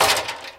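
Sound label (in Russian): смачный вибрирующий удар по двери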